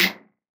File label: snare; goodtakimu drumkit; fl studio; drumkits